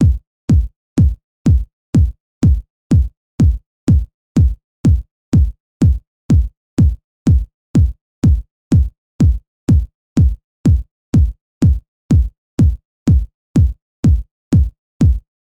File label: 124bpms kick